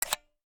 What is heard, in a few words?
Menu Close; Call of Duty Black Ops Cold War